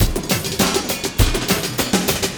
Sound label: LOOP, Drum & Bass, 100 BPM